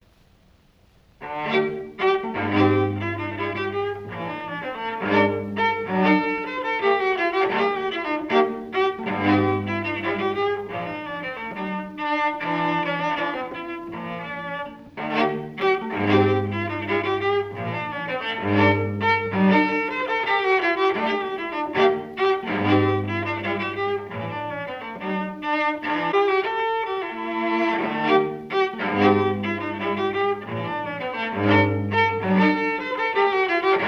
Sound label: Cello Suite No 6 in D Major Gavotte I Gavotte II